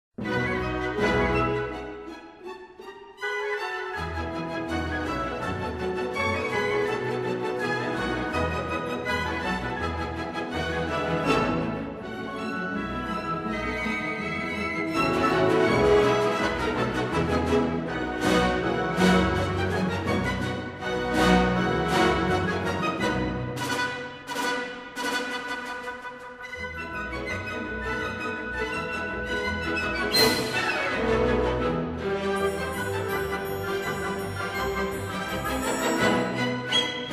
Polka; Excursion Train; Johan Strauss

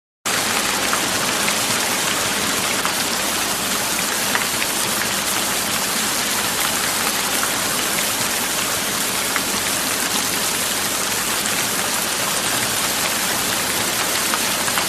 rain and thunderstorms; Sleep Sounds of Nature

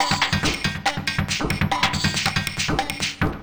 Perc Loop, Dance, Beats

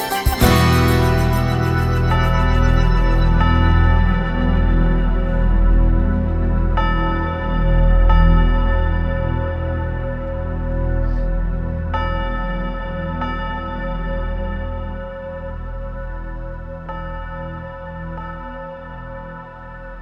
Ghost Bells; Instrumental

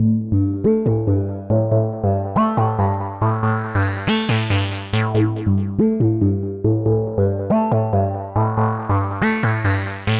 Synth, Synths Lead; Dance

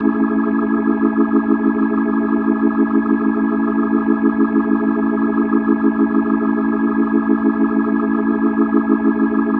Organ A Maj7, Organ Licks

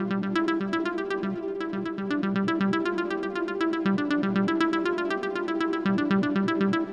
SCI-FI

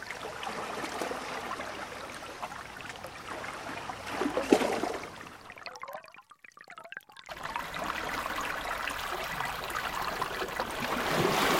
Shoreline - Port of Call; OCEAN; Sounds of the Earth; Sleep Sounds of Nature

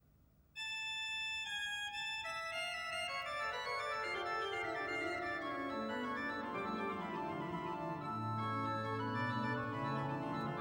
Organ prelude; Podbielski

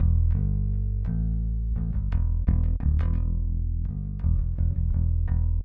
Metal Deep Bass; Rock

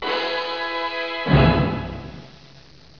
DOUBLE E, ORCHHITS; MUSIC

